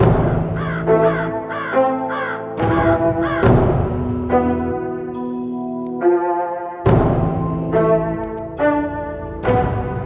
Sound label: heavy metal version